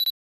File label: camera focus, ui